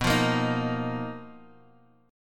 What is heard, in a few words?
B Major 7th Flat 5th B Guitar Half Step Down